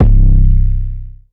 808 (C); Android; Cymatics; GHOST (Beta Pack)

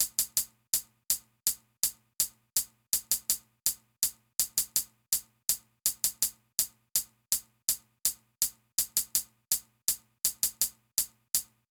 Darling Drum Loop - 164 BPM Hihat, Boom Bap; Drum Loops; Cymatics; GHOST (Beta Pack)

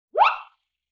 Wind Whistle Zip; Vintage Cartoon; Musical Elements

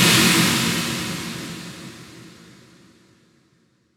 Noise Impact

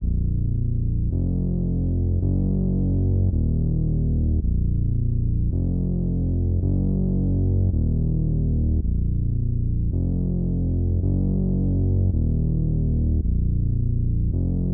109 BPM A# Min Bass; Melody; Loop Stems; Cymatics; 2022 Melody Collection Platinum Expansion - Pt 1; Finish Line

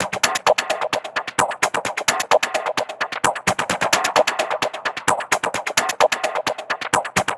Perc Loop 130, techno; Loops; Percussion; GhostHack; Ghosthack - Free Techno Sounds 2024

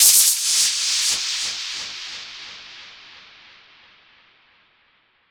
Fx 130, Techno; Techno Sounds 2024

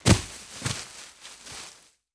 Body Fall Leaves Grass

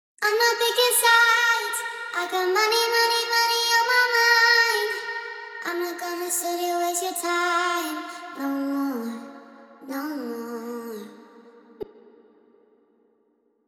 155 BPM D# Min Vocals; Trap; Melody Loops; Cymatics; Nightmares Trap Melody Loops; Money Mind

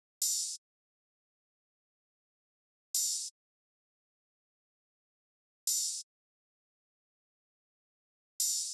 Drum Loop 177 BPM Open Hihat; Loop Stems; Cymatics; Mystery Pack Vol 4; Suave Drum Loop

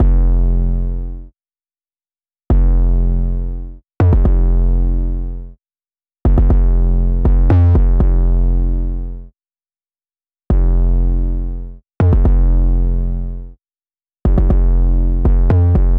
120 BPM F Min 808; Melodies; Loop Stems; Cymatics; Foolish; PHARAOH

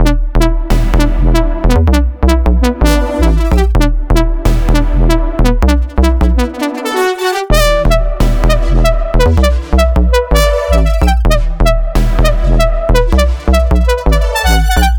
128 BPM E Min, house drop loops; Loud; Cymatics

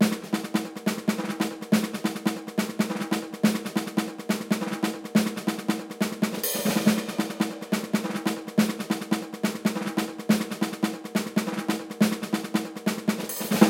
Snares 140BPM; Percussion Kits; STEMS; Ghosthack; SkyRealm; Kit 12 - Birth Right

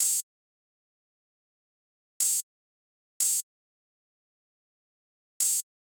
Drum Loop 150 BPM Open Hat, Trap; Alterations; Cymatics; ANALOG EVOLUTION Beta

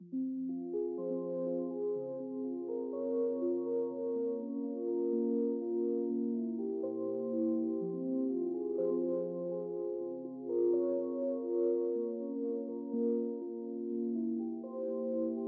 123 BPM F Min Bell, Hip Hop; Melody Loops; Loop Stems; Cymatics; Vuelve

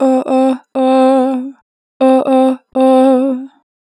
Oh - 2 bar vib, Natural Vibrato, Vocals; Harmor Vocals

